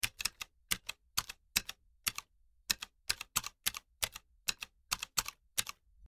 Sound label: Slow Typing, keyboard